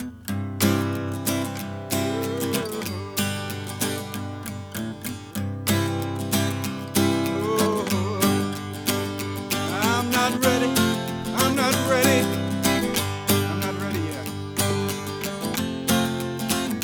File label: solo acoustic version